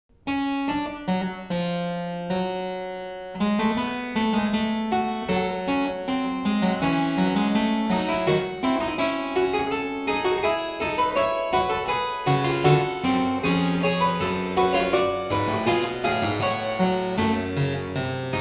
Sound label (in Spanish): Fuga BWV 861 de Bach